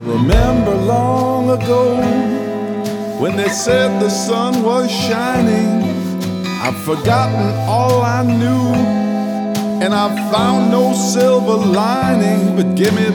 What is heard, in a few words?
Scratching, Gimme that Harp